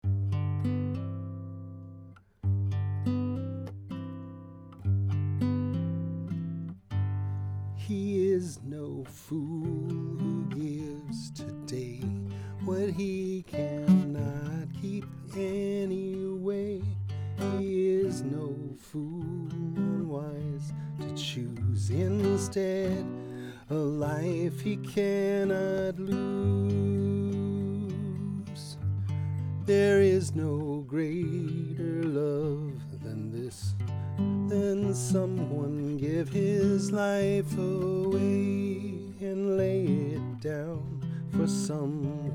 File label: guitar